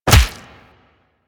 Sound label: Melee Fist Impact Flesh; Warframe; Weapons; Fists